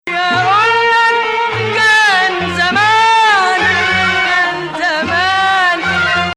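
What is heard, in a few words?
Ajam Hijaz, Arabic music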